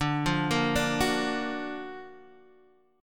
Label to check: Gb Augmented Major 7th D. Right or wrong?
Right